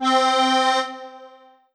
Yamaha MU90R French Acord C4

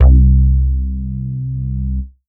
E-Mu Proteus 2 Synth Bass C4

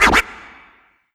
Vinyl Scratch

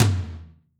Floor Tom

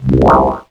Yamaha PSS-140 Gurgle

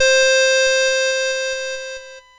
1980s Casio Celesta C5

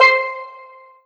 Roland SC-88 Steel Drum C5

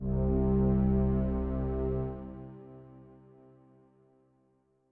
String Brass C4, Synthesiz; Synthesizer - Strings

